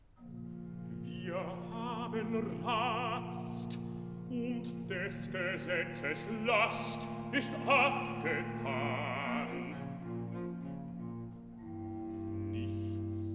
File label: Recitativo (Bass); Wir haben Rast; Bach; Cantatas; BWV 030